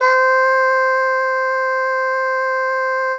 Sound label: miku sweet c5